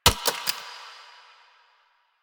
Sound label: Shot Mech, Weapons; Warframe; Acceltra Prime